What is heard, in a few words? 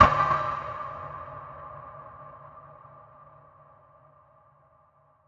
metal shot; One Shot FX; Sample Magic; Transmission-X